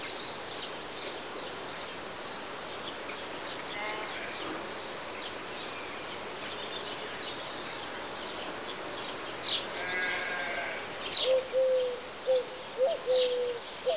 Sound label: FARM